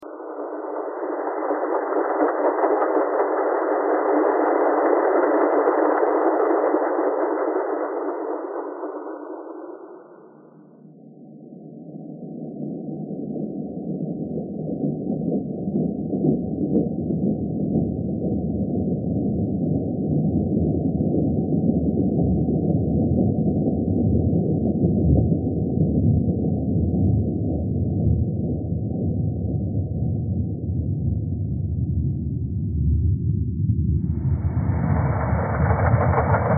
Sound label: thread simple